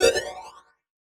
Multimedia Internet CD-Rom Flash Animation Musical